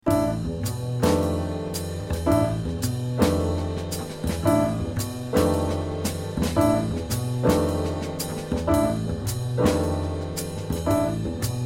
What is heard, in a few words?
minus melody